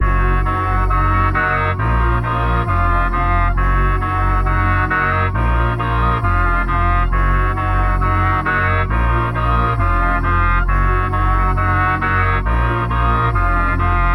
135 BPM Bbm; Safehouse

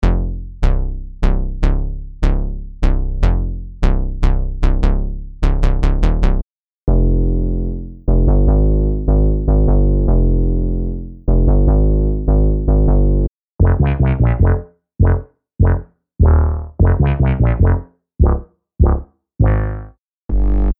BD Hip Hop Rap Bass

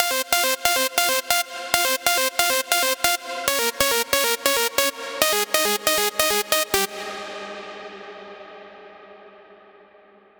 Melody Pluck Trance Psytrance; midi wizard

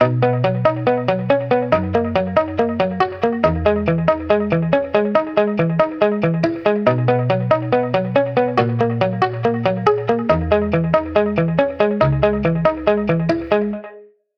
B 140bpm; Unreachable

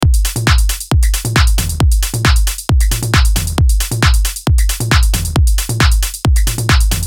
Trance Psytrance 135BPM

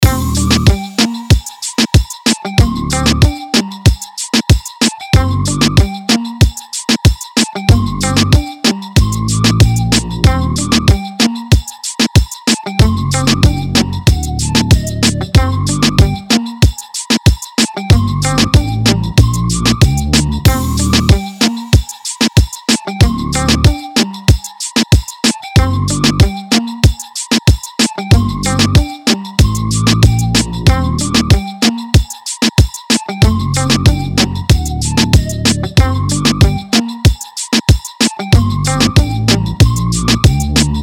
188 BPM Gm; UNISON DEMO; Salud

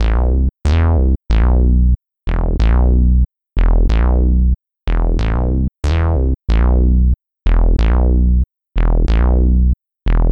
185 BPM Am

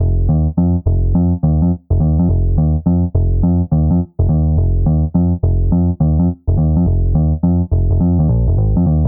Funk

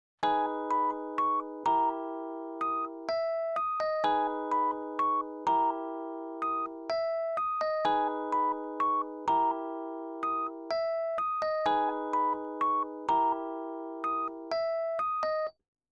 Melody Lo Fi

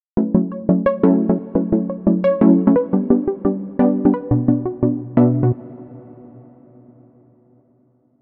Hybrid Advanced Drum Bass; midi wizard 2; Unison

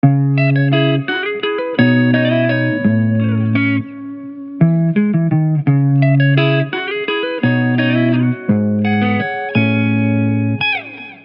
170 BPM Cm

